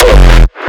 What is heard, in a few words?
Raw Kick; The FREE Raw-Hardstyle Kick Pack Vol.1